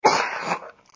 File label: Choke; Lewd SFX